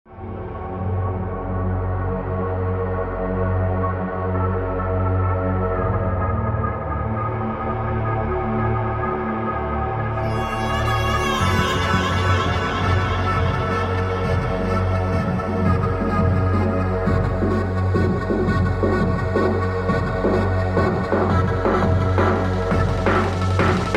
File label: Surface Aero Chord bpm90